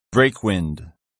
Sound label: breakwind